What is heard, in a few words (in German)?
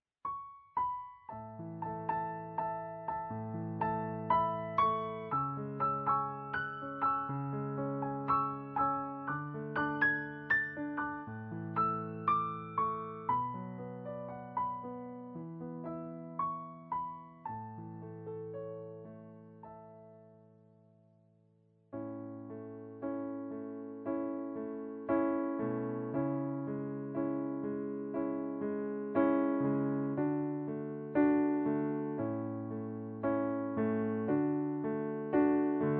Playback A-Dur